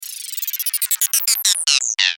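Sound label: sound effect of dropped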